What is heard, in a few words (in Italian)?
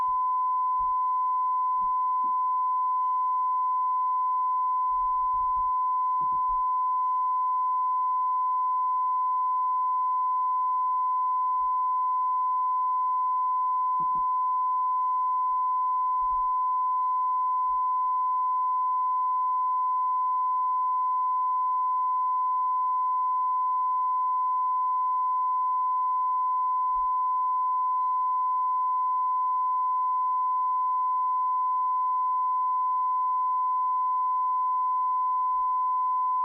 Calibrazione 94dB